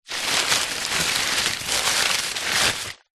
paper newspaper balls scrunched up many movements rustling; zapsplat; foley